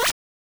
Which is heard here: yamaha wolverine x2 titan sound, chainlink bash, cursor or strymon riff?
cursor